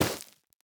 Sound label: Netherwart break; minecraft